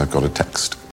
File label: Text Tone; Sherlock